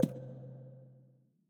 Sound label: button Down; asgardian stones